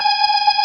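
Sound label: B3 G#5, organ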